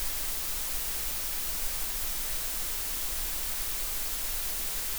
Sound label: Hi Grunge